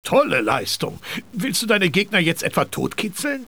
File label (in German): kitzeln; cstrike